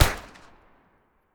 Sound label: MP40 Single Shot; Jagged Alliance 3